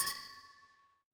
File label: ui click